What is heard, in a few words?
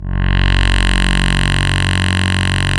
SYN SPACE; MicroWave; Sound & Vision - Gigapack I CD 2